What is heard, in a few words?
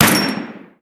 Gunshot